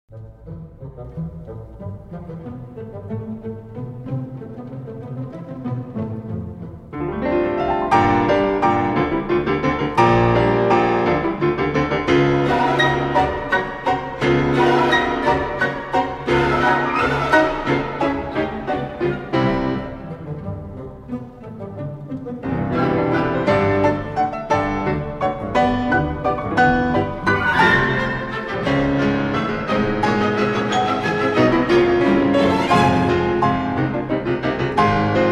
Piano Concerto No. 3 in C Major, Allegro, Ma Non Troppo; Op. 26